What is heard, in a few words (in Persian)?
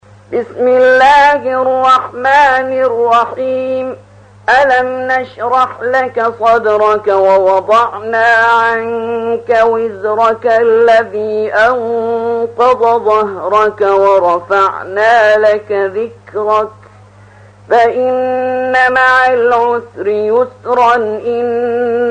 ترتیل سوره شرح